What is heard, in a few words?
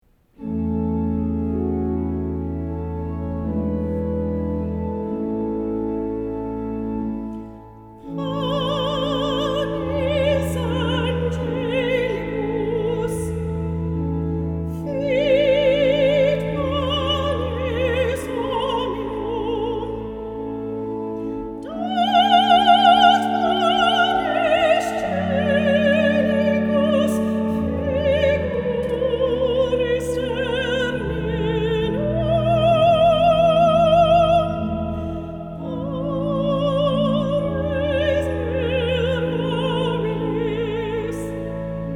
Panis Angelicus, weddings music